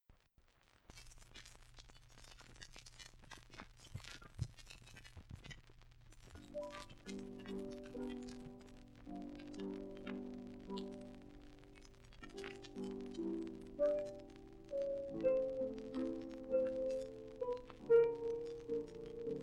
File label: Piano; Evening Star; Richard Wagner; 1943 wagner family recordings